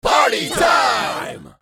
Party time crowd celebration

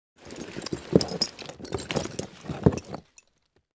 Objects office cardboard box handle

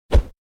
Short deep whoosh

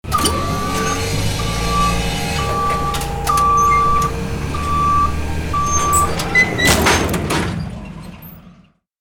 Forklift reverse and cargo drop; construction vehicles

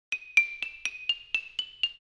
Cartoon sneaky tiny walk; cartoon running and walking

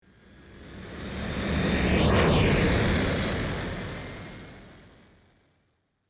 Military jet airplane pass by overhead fly by; aircraft

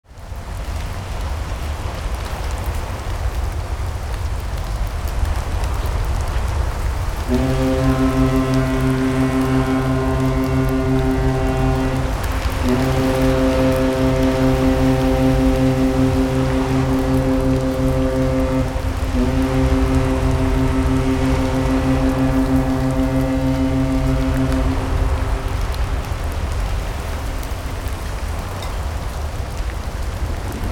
Ocean storm ship perspective ambience

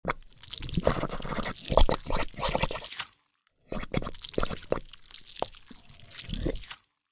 Cartoon accent slimy bubbling air releasing

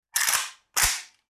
AK-47 chambering

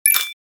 Futuristic positive accent

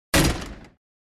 Metal door slamming shut

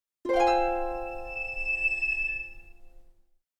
Video game reward chest opening fantasy

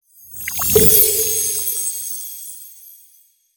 Magical cartoon pop

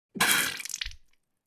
Mouth spitting out blood

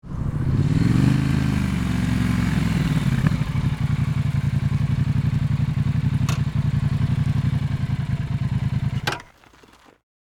Motorcycle driving decelerating stopping; vehicle

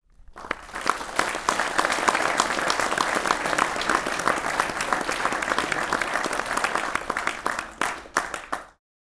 Crowd reaction people applause

